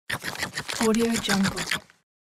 chewing and munching; misc game sounds

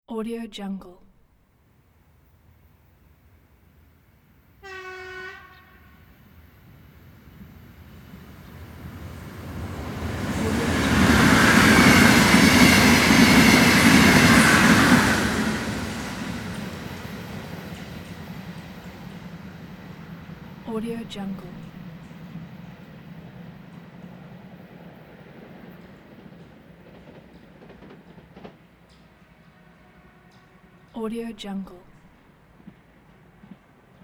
express train passing by

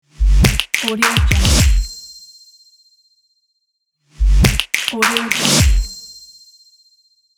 percussion logo

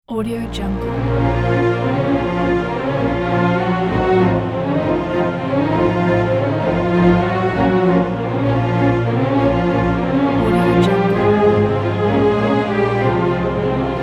italian opera opener ident; bequadro

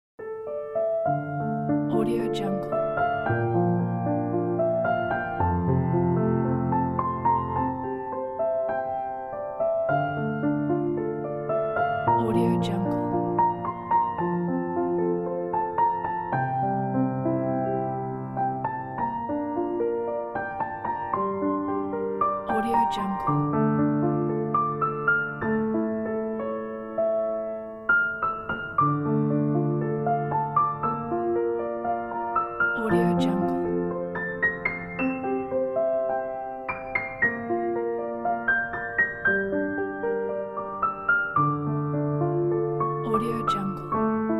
simple piano background

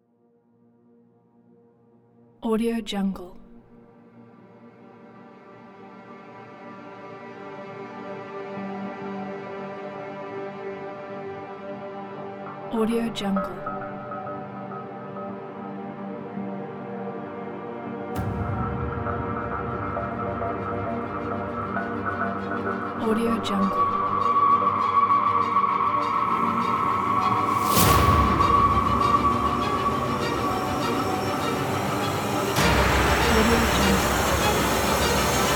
epic orchestral horror trailer

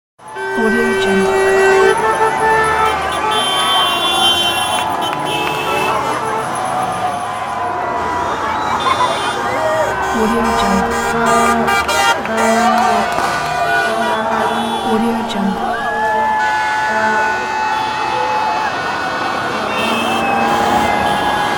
festival crowd ambience

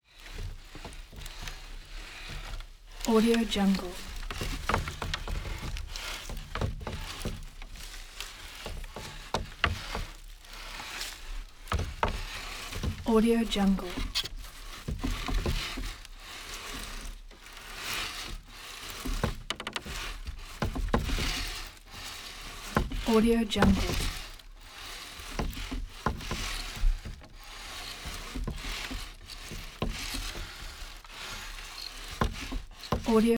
wood sliding rub active wood sled

